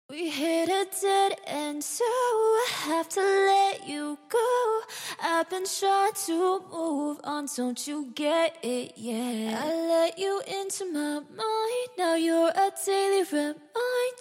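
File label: house dance pop 100 G major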